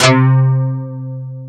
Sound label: DS8 C 2, Korg; Back In Time Records; Korg Universe VOL-1